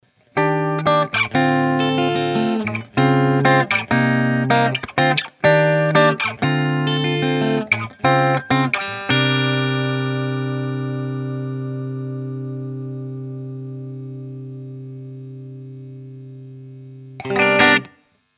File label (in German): framus artist phil xg p90 p90 clean steg